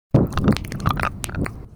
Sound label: skeleton sfx